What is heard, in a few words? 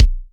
Sub Perc, Bassdrums; Vengeance Samples